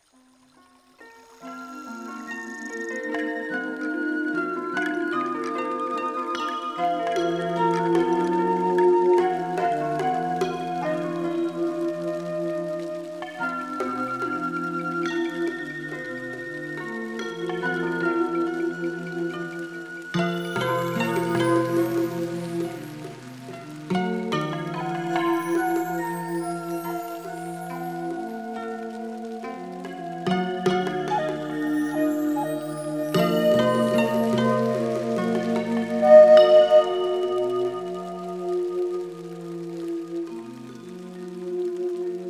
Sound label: Japanese atmosphere